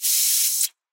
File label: air